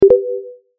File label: chat notification